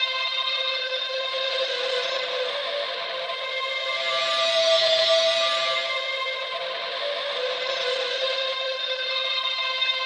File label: ANGUISH PAD; Distored Reality 1&2